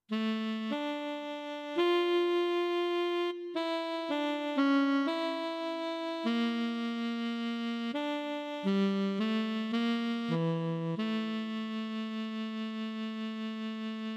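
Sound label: Breathy Tenor, digital piano; REED; 05. / Breathy Tenor; I SUONI - GLI STRUMENTI XG; clavinova clp270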